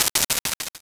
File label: Transistor Medley; Convolution; Special Effects; IR Samples; Kontakt 5